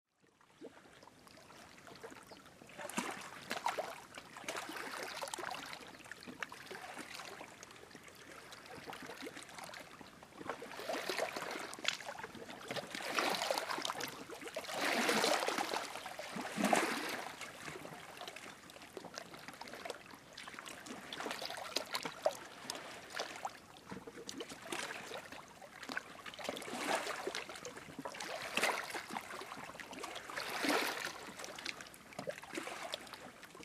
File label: Small waves; sea